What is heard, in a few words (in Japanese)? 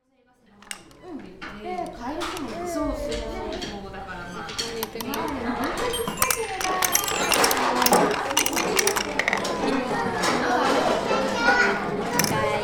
baketu otosi; Chino Cultural Complex